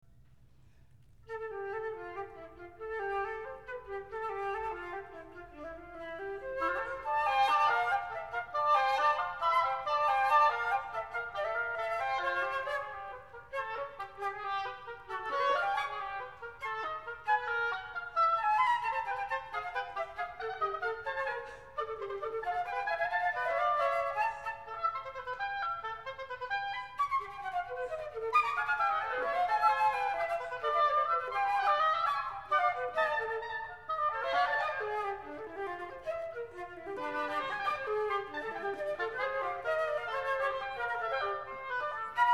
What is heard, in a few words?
Repair for flute and oboe